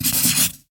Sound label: Pencil Writing; Realme 8